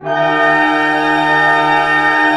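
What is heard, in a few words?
pentatonic A pianissimo, orchestra; Sound On Sound; Peter Siedleczek's Orchestra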